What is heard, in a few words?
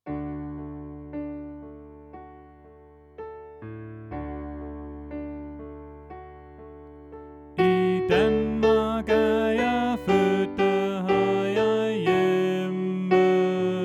Sopran